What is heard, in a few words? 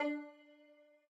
chord (D)